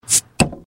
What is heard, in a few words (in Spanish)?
abriendo refresco